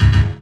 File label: Synths C#; Vengeance Samples